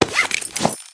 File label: Character Creator; Rabbids Multiverse